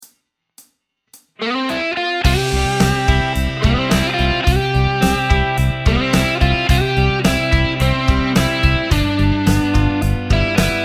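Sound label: backing arpeggio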